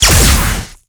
ball orchid; storm spirit

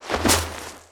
tag team target; tusk

